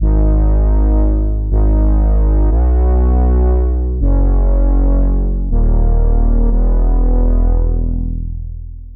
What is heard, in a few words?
Ambient Downtempo